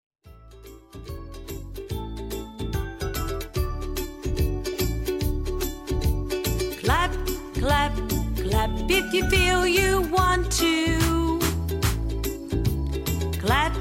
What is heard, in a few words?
Clap Clap